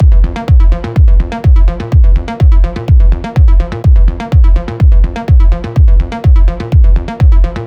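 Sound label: synth loop B flat minor 125 bpm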